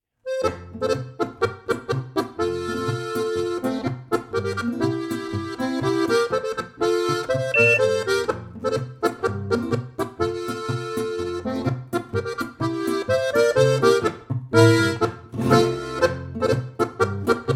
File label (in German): Polka